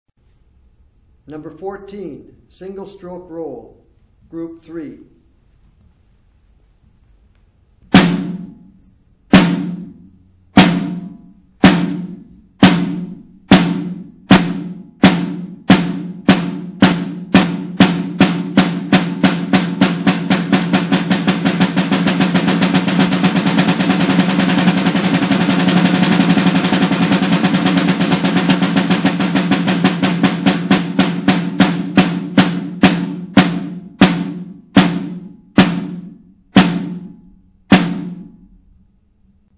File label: snare rudiment